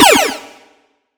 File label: laser; Free SFX for anything